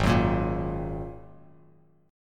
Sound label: Ab 7th Suspended 2nd Ab, Bass Standard; Ab7sus2 strummed; 4,9,8,3